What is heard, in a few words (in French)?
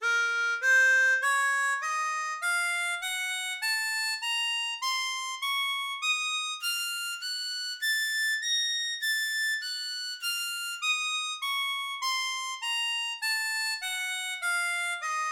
La D Mineur, Gammes, harmonica